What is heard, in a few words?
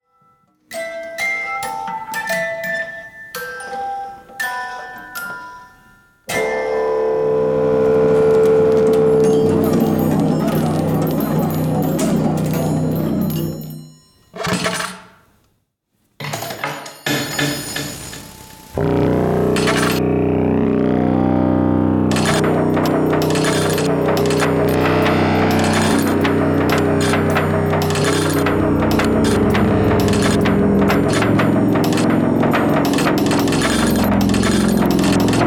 trumpet